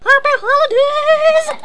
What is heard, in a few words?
HAPPY